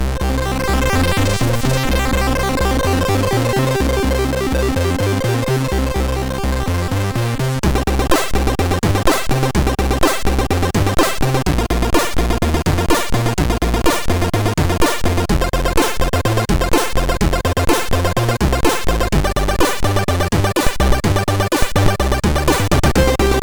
Chiptune, MOS6581R3; sid

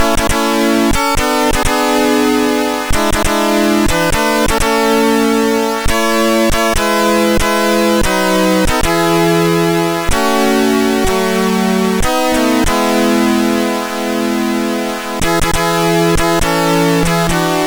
Swiss National Hymn

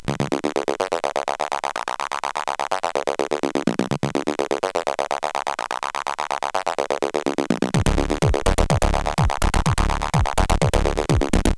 Small Techno, CSG8580R5

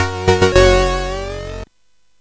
Tube, CSG8580R5

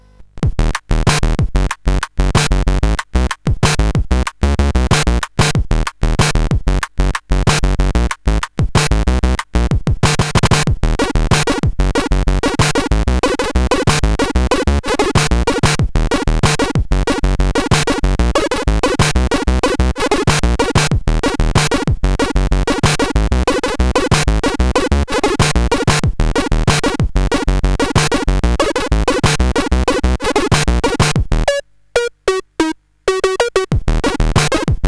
sid, CSG8580; Dude with Attitude; hvsc

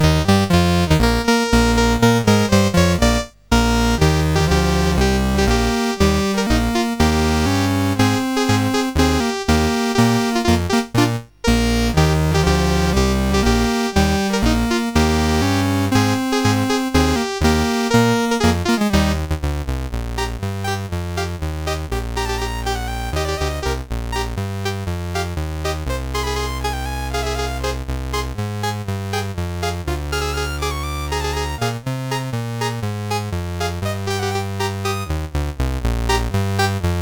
Jingle Bells; Elgar

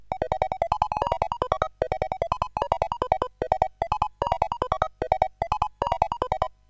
Demo, CSG8580R5; sid

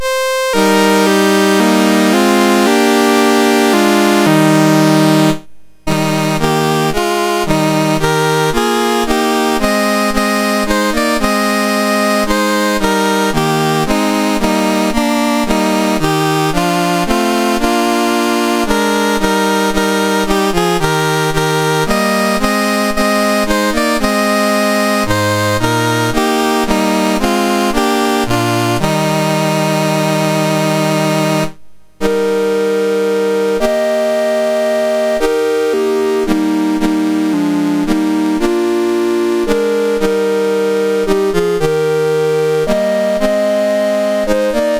Wales, National Anthems, MOS6581R4; Dave Marquis

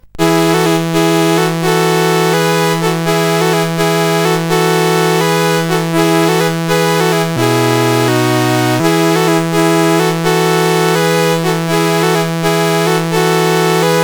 CSG8580R5, GAMES; Woodpecker

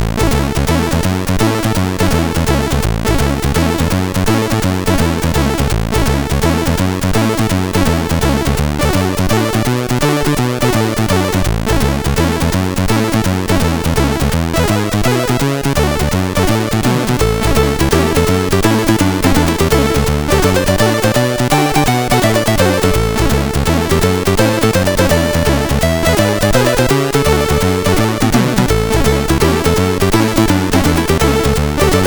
Rock n Roll, MOS6581R4; hvsc

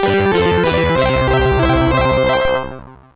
sid 8580; Wozzle

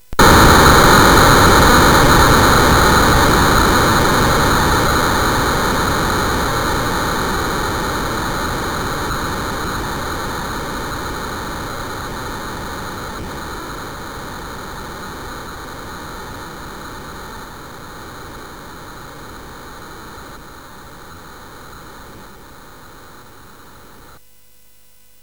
Frantic, CSG8580R5

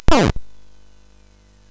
Frantic, MOS6581R4

